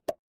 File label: cartoon pop